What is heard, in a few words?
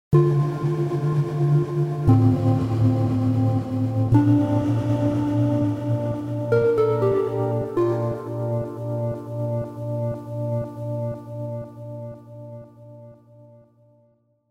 Air Pluck